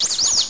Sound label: wolf whistle; charley cat at the flix